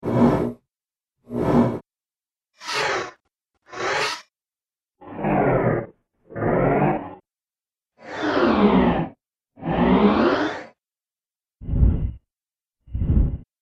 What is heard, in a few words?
Space Doors; Science Fiction Sound Effects